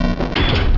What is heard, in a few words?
Gear Grind; pokerogue; battle anims